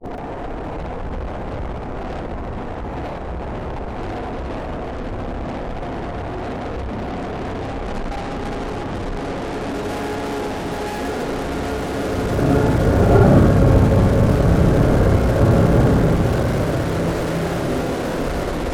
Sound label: in a tunnel train passing remix